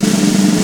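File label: snare roll rock, Yamaha Motif ES drum machine; 300 Drum Machines; Yamaha Motif ES-Series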